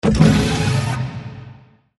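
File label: notification message; Stellaris; ringtone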